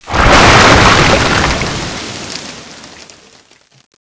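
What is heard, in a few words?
megalodon death; boss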